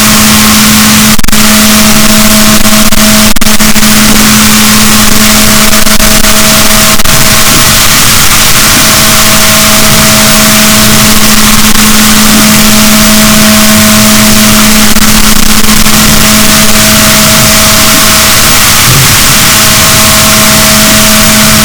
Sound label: rorquals negatif, boat